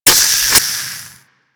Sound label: Animal Foundry Detox; Tenno; Warframe; Liset; Hub